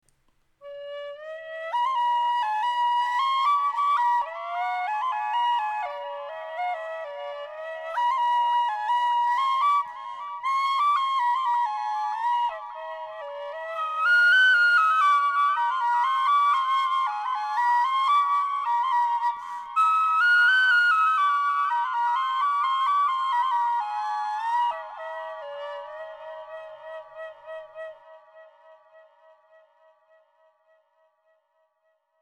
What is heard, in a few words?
mono repeater tin whistle